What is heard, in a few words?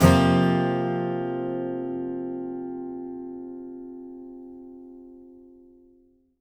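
acoustic guitar F sus down, Whole Lotta Country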